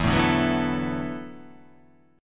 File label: E 9th Suspended 4th E down